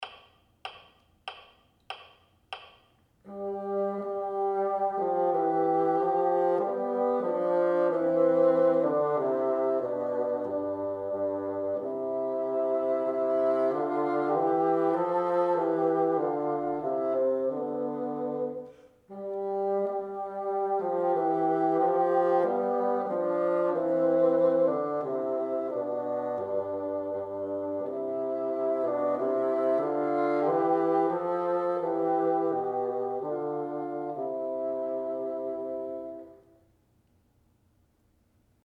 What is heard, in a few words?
together, bassoon